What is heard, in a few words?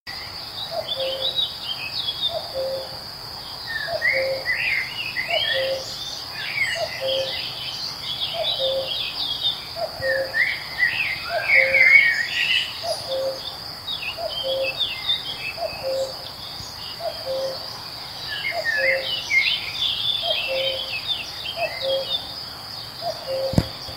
cuckoo